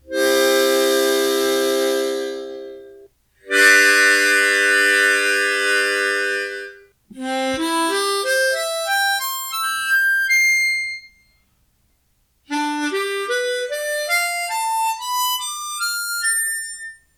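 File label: just tuning, harp; Seydel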